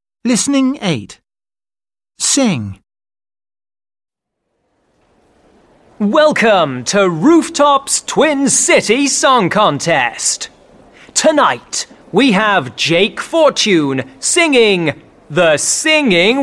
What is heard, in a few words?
song, the singing water